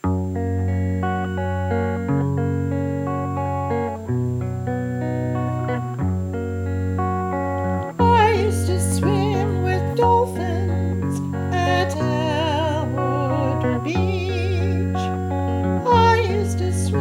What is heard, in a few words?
Swimming with Dolphins voices and guitar